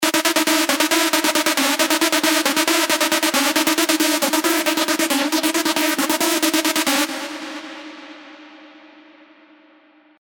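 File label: sequence, LoFi HiTech, Sylenth1; alonso 18 year anniversary pack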